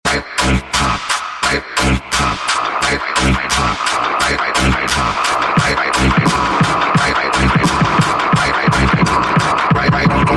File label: Bass Music